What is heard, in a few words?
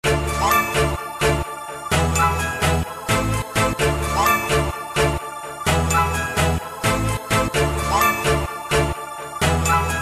Remix